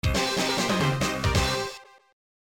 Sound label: game over